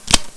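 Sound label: sniper reload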